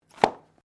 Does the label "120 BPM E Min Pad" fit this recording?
No